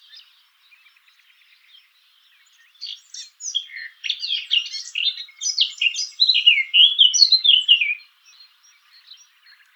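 call, Blackcap, a soft beginning, a louder warbling and a final fluted part. Background: Nightingale and Italian Sparrow.; sylvia atricapilla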